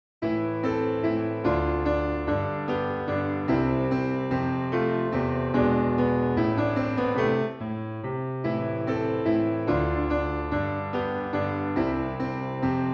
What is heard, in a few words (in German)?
klavierstuck